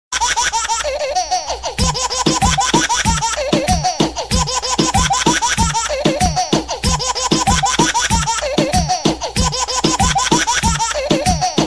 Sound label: baby remix